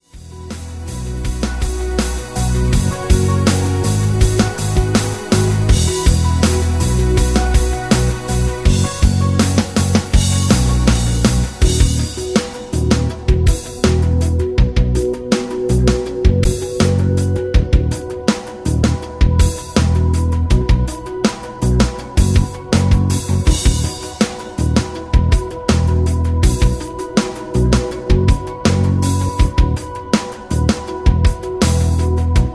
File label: rhythm